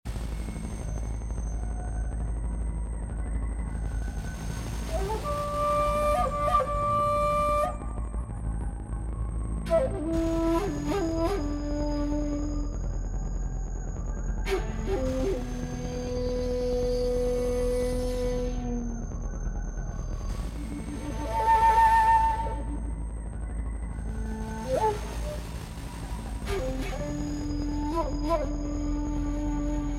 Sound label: Ethnic Atmosphere; Erminio Cella